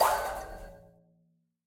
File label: Giant robot Shot; Lab Giantrobot Shot - Marker